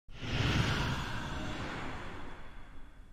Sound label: flame